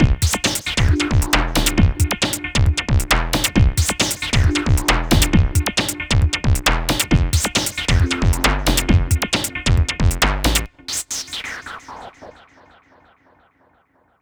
Hardcore Breakbeat, 135 Gmin; Big Fish Audio; Hardcore Breakbeat Action; Ave A

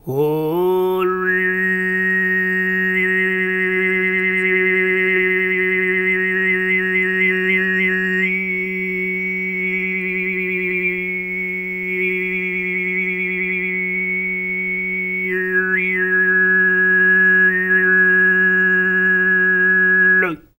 FREE, TUVAN MID; Spectrasonics Vocal Planet CD5; World Voices

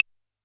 D#5 Analog Claves, Yamaha PSR-E323; Analog Kit; drum machine

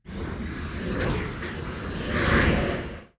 noise fx; Masterbits; Switch FX

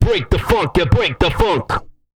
BRK FUNK; ILIO; Vocal Planet

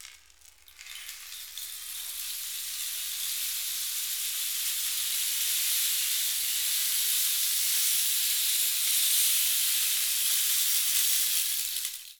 RAIN STIC; Latin Drums & Rain

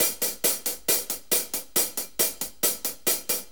4-4 HiHat Loop

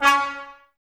brass, Tbs Mute

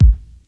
retro kick; DRUMULATOR; Crypton - DrumBase5000

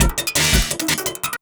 LOOP, Drum & Bass, 170 BPM

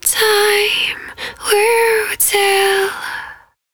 110bpm Ab, Velvet Vocal; Zero-G

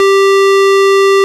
ORG VIP Cl 8'G 7, Farfisa Clar; Farfisa Clar DBar

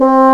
BASSOON C4, WIND; AKAI S-Series CD-ROM Sound Library VOL-2

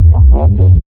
LP BASS; neuro; BIG5AUDIO

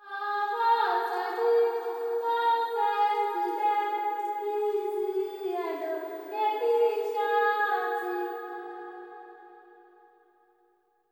LTLE GIRL, AFR.GIRL

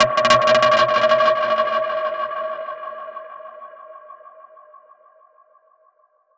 Perc Hit, dub percussion

